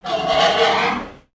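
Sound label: Pets Triceratops Neutral; creatures; Lego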